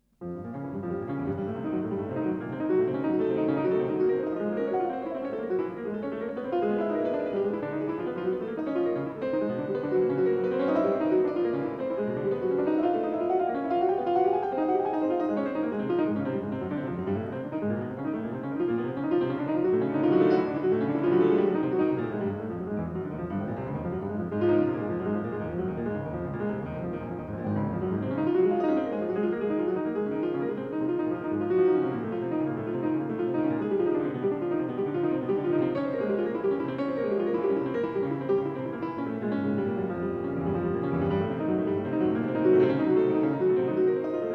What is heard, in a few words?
Piano Sonata in B flat minor, Presto; Chopin